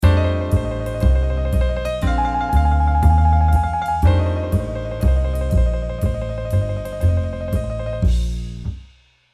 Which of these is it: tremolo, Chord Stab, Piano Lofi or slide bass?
tremolo